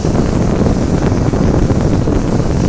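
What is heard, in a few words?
flame jet loop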